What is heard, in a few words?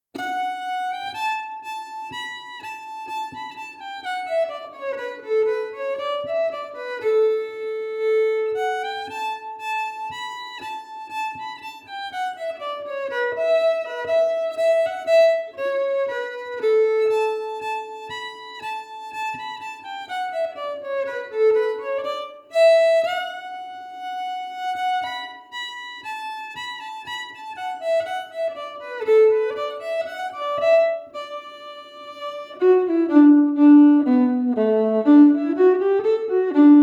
Old Parnell Reel slow